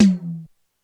Hi Tom, Korg DSS-1 Drums

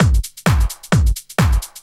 drum 130 trance

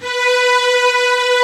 STRING; jazz jackrabbit 2

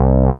bass C, Bass Shots, Chicago House; Classic Chicago House

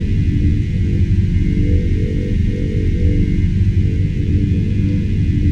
CHOIR CELLO

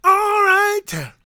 GOSP MALE, Vocal Gospel; Vocal Planet Vol1 Gospel

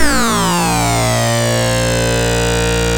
ARP Synths, RUMBLE REZ A0; E-MU Formula 4000 Series Vol. 3; Analog Odyssey

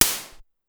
Noise Hit, Pitched Up, Clavia DDrum; Clavia DDrum-AT

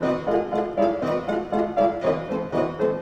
Orchestral Loops; 128 VERTIGO

